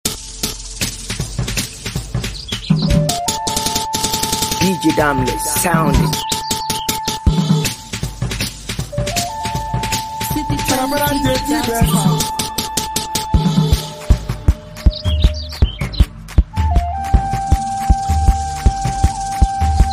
Mara Resigned Beat

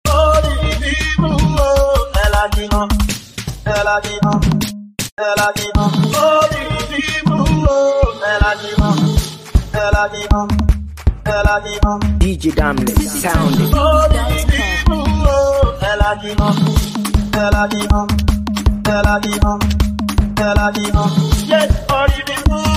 Elagimo Dance Beat

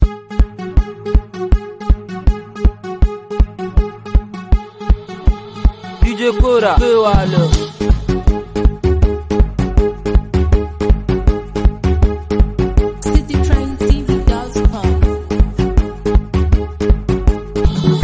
Beat

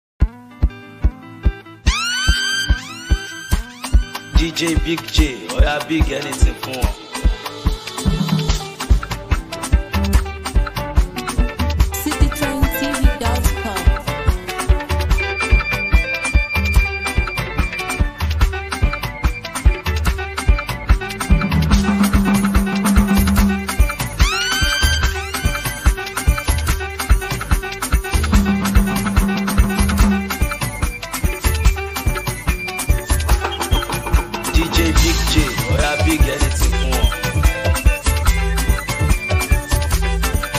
South East Mara Beat

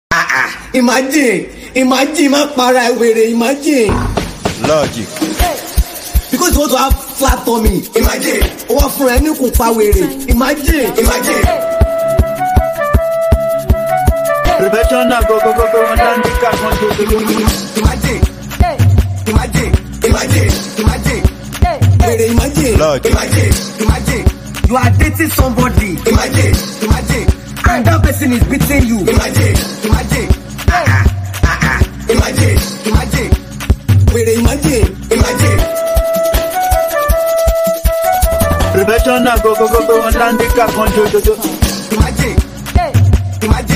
Beat

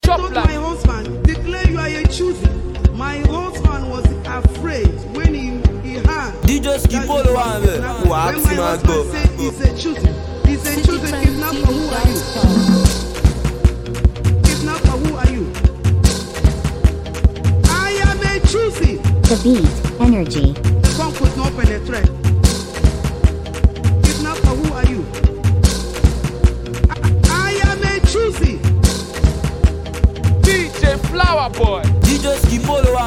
Chosen Cruise Beat

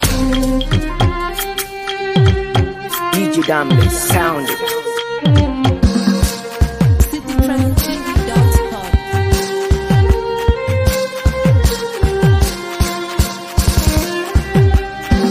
Violin Beat

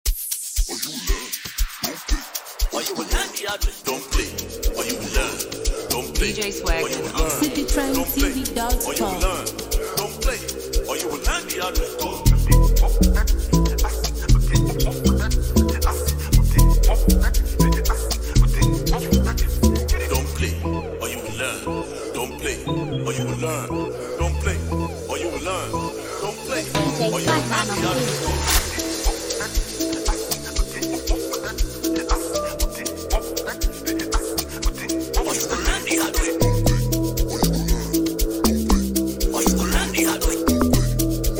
Amapiano

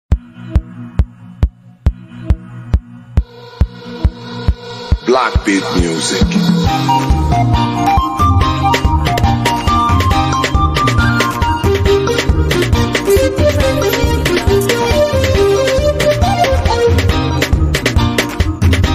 Arewa Mara Beat

